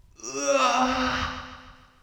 uaaa

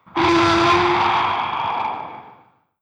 ghost ah; 女系 霊 13; 霊の息 あ〜（エフェクト） 単

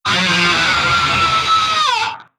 gyaaaa; 女; 悲鳴２ あ゛あ゛あぁぁ〜!!!; 激しい悲鳴 デス声

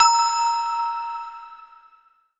mysterious; ＳＥ ティーン…; ミステリアス効果音 気づく・アイテム取得・イベント

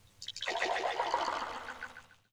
mizuoto; 水音２; 水を汲む・そそぐ 水に何か沈める お風呂

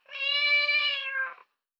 nyaa; ニャ〜; 猫の鳴き声

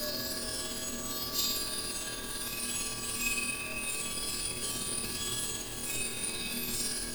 water; ウォーターホーン11; 不穏でミステリアスな音 Waterphone

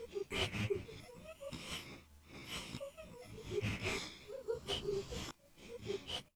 ihihi; 女; 笑い10 イヒヒヒ （女児）; ２〜３人でささやき笑い 座敷わらし ループ

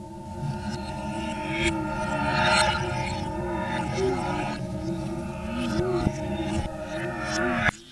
water; ウォーターホーン08; 不穏でミステリアスな音 Waterphone

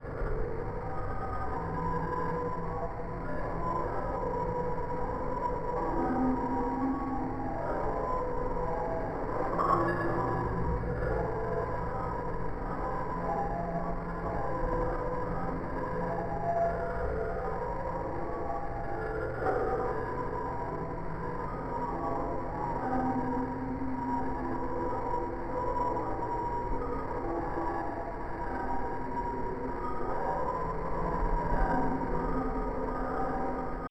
water; ウォーターホーン04; 不穏でミステリアスな音 Waterphone

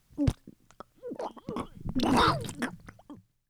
outo; 女; 嘔吐; ゲボっと吐く 吐血する 口から何か生まれる

